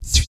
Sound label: ELASTC TUBA, Vocal Blues Soul